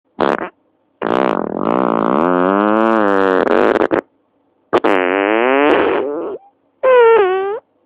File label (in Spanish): Pedo con cuerpo que se diluye